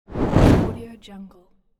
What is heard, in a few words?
woosh; transitions and movement